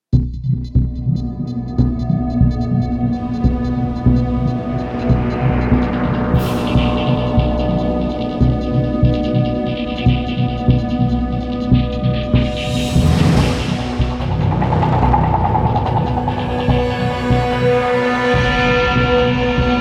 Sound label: Combat Dogfight; Elite Dangerous